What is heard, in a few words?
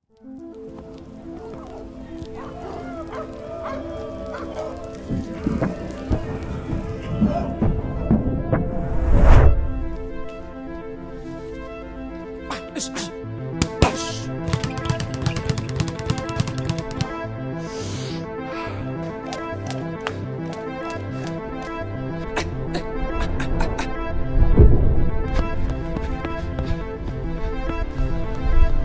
cinematic; ringtones